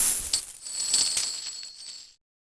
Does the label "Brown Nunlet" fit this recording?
No